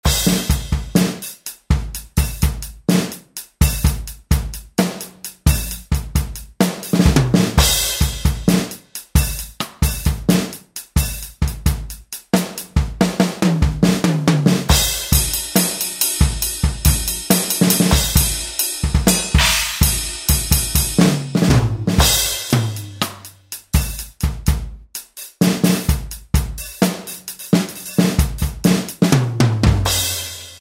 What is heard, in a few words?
GM Kit; Sonic Reality Modern Songwriter